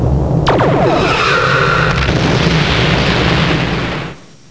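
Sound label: torpedo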